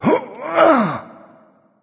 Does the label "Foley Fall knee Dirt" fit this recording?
No